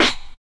combi drums

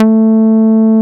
HOUS A4, HOUSE BS; Drums In Your FACE!

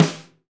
SD, Real Kit; 90 s Sample CDs; Sound & Vision - Gigapack I CD 1 (Roland); Real-Kit m16

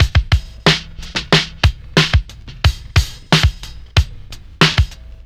Drums, Cinematic Funk; Sonic Foundry (Sony Creative Software); Cinematic Funk Urban Tone Poems

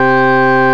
Gritty B Wave C2; Proteus 2000; Digital Sound Factory Proteus 2000

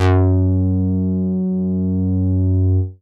CONCRETE BASS; Masterbits; Dance Trax

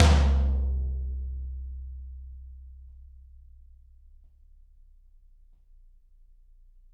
Toms, Drums; Bob Clearmountain Drums I

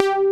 BIG MOOG BS; Pro-Rec - Synthsonic; BASSES

